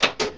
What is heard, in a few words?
CLUNK; homm2